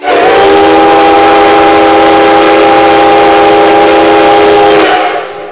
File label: horn